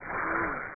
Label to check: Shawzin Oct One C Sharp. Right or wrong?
Wrong